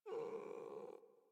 Puppy Idle Growl Playful; Warframe; Kubrow

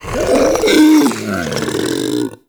Infested Vocal Charge, Enemies; Warframe; Femur

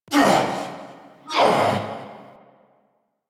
Pain Grunt; en; Warframe; Nightwave; Season Three Glassmaker; Nihil Fight

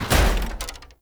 Foley Death Body Fall; Enemy; Nimrod

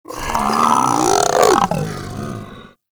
Infested Femur Vocal Charge; Warframe

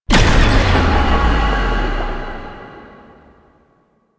Apparition Spectral Impact; Warframe; Warframes; Dagath

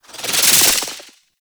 Slinky Movement Rattle; Warframe; Man In The Wall

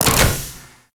Gas City Claw Lift End; Ambience; Props; Warframe; Corpus Gas City; Props Remaster

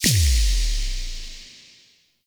Thorn Bow Arrow Impact Enemy; Weapon Impacts; Player; Bows; Envoy Fey Bow

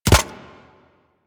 Arachnoid Micro Land; Warframe; Corpus

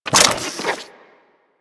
Vomit Gun Reload, Weapons, Infested; Warframe

